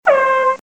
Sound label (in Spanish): corneta